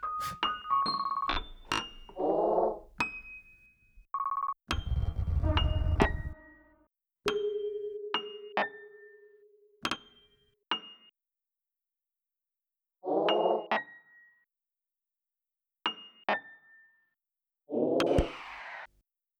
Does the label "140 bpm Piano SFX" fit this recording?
Yes